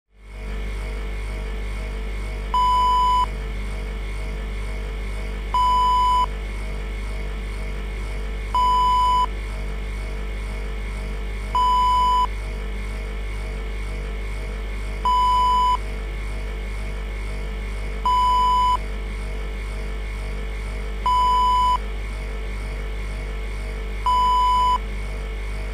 fridge hum

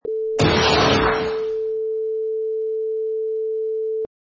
Explosion

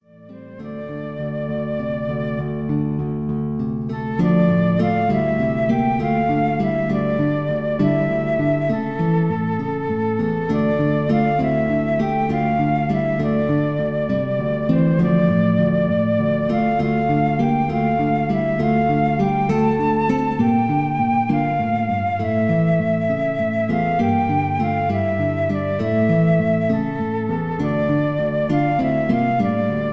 Guitar and Flute; The Angel Gabriel

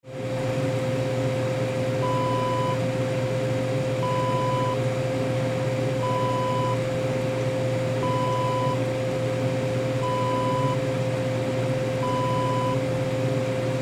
ice machine hum

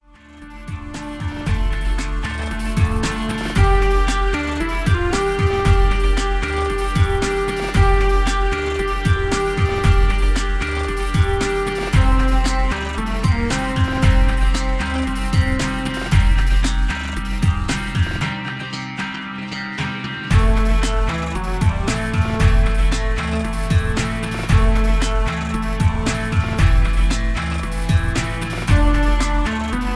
Pulpy Brass

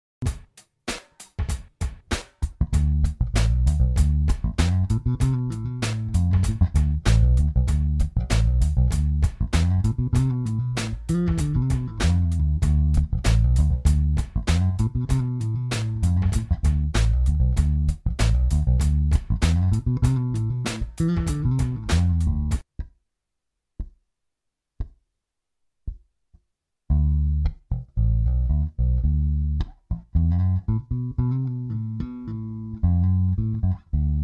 Mostly pentatonic in D

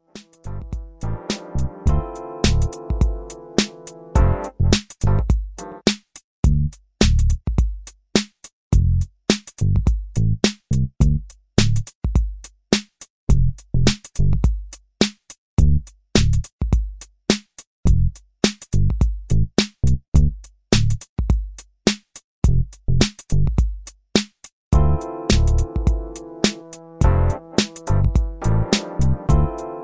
New Wave Jazz

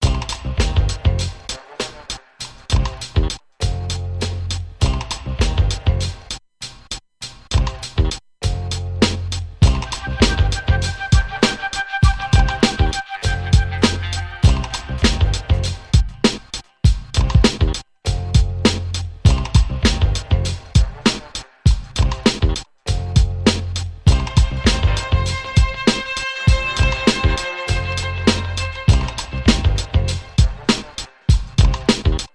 beat

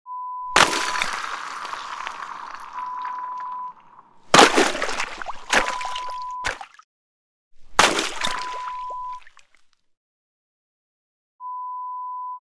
Breaking Ice

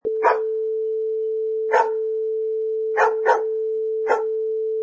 Dog Barks 5 Times

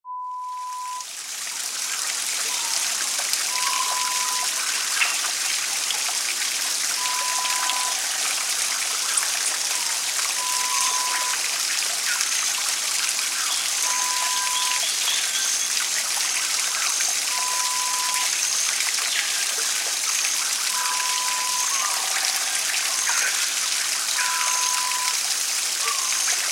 Rainforest sound

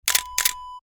camera shutter click

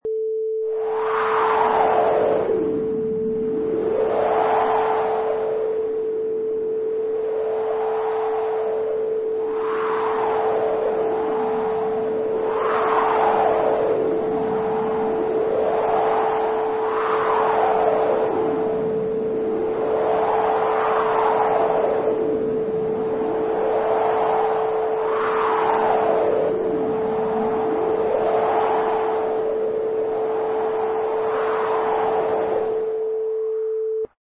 Wind

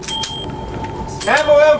tram

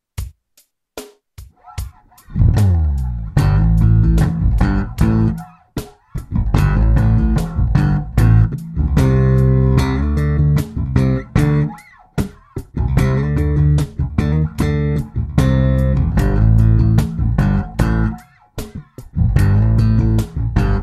Strummed octaves in A and D

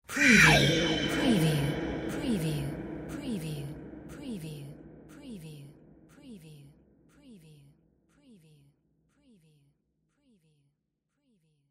sci fi whispers spooky eeyow; windy beach audio

